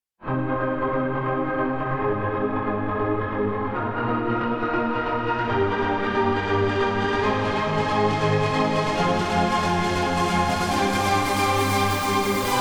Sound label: synth wet